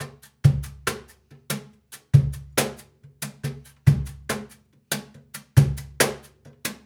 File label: Cajun, Percussion, Drums; Essential Percussion